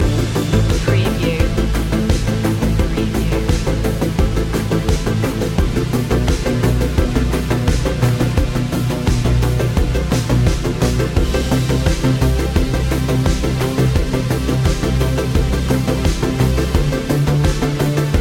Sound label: drive mode loop